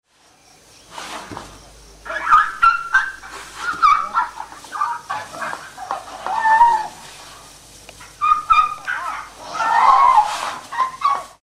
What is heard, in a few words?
chirps